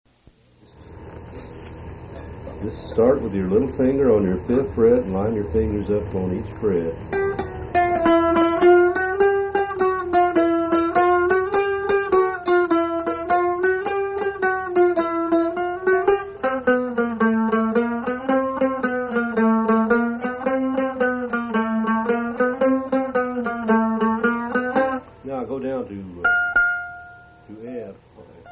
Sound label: Chromatic Exercise